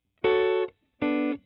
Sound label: Rythm GTR OD